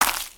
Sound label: Footstep Leaves; creatures pets